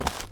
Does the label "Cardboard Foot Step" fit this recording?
Yes